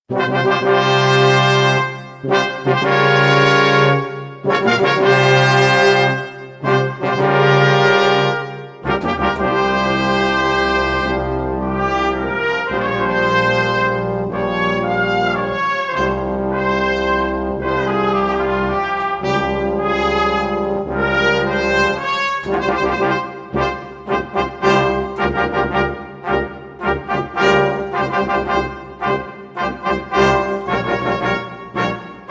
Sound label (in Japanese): ファンファーレ, リコーダー; 東方紅楼夢5